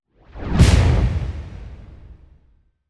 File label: Swell To Impact; bottlerocket